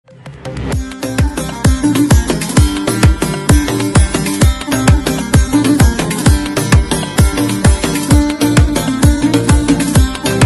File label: turkmen, jazz